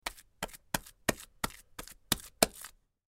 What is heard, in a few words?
Mud Hands Smack, Mud Hands Smack Pat Multiple; Squishes; Foley